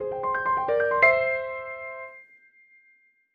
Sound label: big Win End; PIXIGSLOT; netent